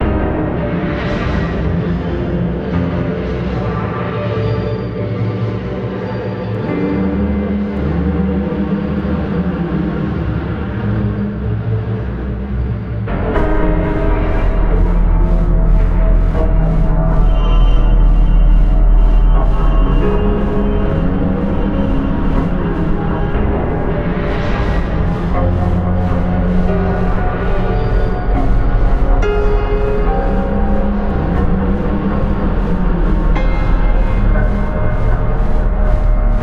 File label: Radio Music; let it die